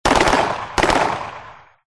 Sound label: autogun; c-evo